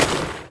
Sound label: cold impact, spells